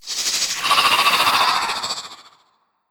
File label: Naga Scream